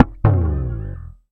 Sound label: P bass uh oh; Sampleheads; Will Lee Bass Library; PBASS EXTRA